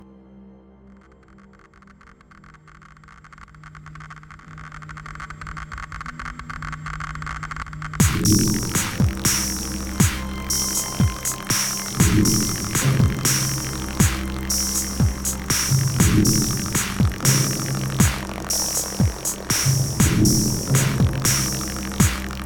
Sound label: Radio Noise; Chernobyl Nuclear Power Plant; Noiseville